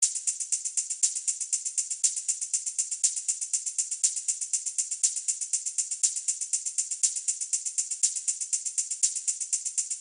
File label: Clock